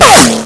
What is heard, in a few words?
ric, weapons sound world; quake2